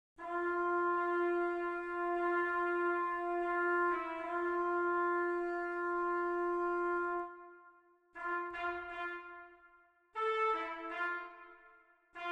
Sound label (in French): Trompette2